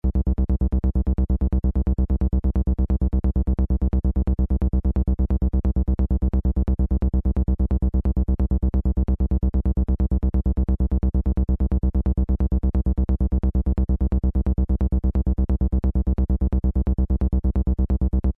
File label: Xoxbox Filter Test